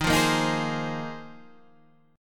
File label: Bb 7th Suspended 2nd Suspended 4th Eb